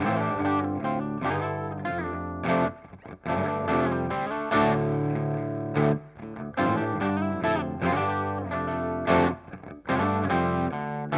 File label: HX Stomp Klon Centaur; Vergleichstest